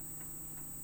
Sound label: Hydrophone